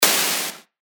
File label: Noise, percussion; revealed percussion vol 3